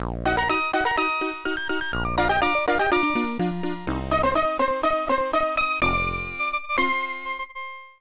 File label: Unused Jingle; MTM; SNES